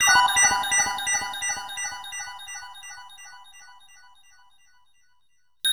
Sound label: FX, Futurist Drum & Bass; Sonic Foundry (Sony Creative Software)